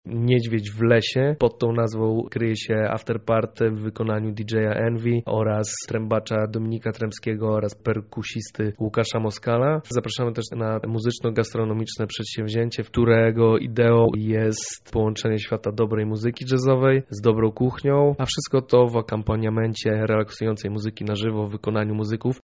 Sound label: jazz; Lublin Jazz Festival